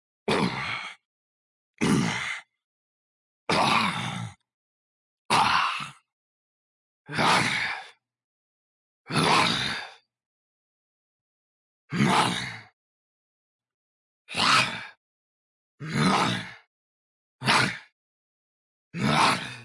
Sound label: zombie pack clean record; outbreaksurvival